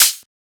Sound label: Open Hat; FLPRO